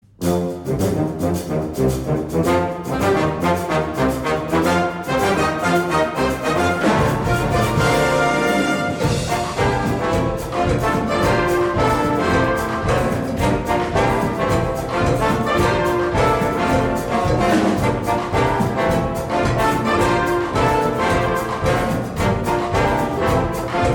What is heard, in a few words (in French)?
CUBAN SOUND